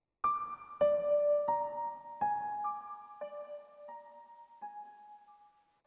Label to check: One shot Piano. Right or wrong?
Right